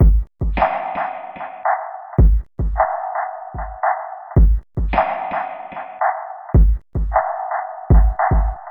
Electronica Drumloop; Drums

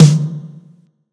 acoustic drums

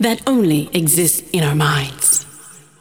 vocals; SoundFactory; SMPMANIA

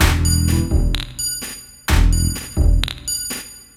Acoustic Bass, Loops; ACID; Basses

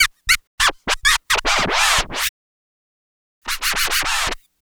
Turntables

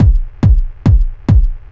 Kick Loop, Drums, Dance Beats

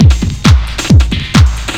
LOOP; SoundFactory; DRUMLOOP

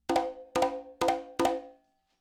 Acoustic Percussion

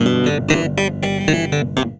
Electric Piano, Loops, Keys

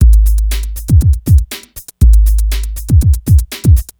Old School Break, Drums

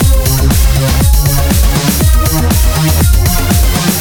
slow bangin, music; Deathchase; Retro

